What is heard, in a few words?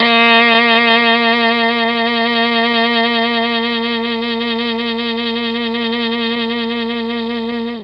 A#2 MKII SAX, Mellotron; Mike Pinder - Mellotron Archive CD